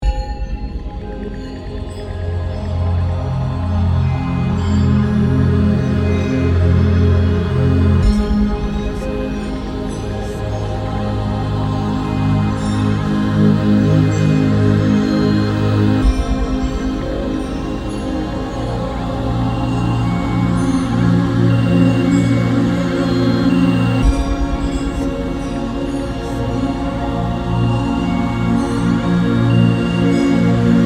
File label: ambient